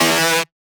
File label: synth screech oneshot E dry; Synth Hits; GhostHack